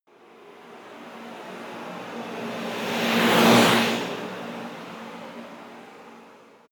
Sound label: fly by flying car